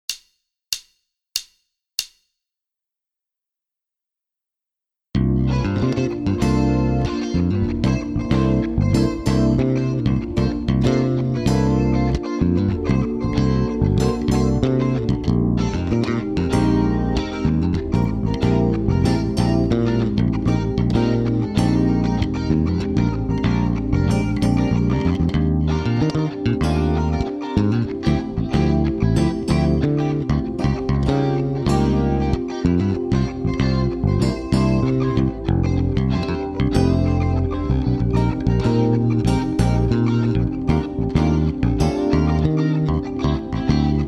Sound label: Medium Fusion, V-Drums